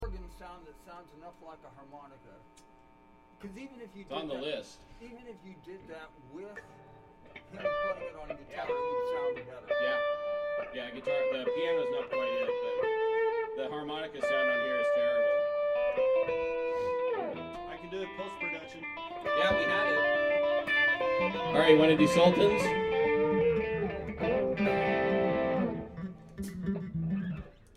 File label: Room Mic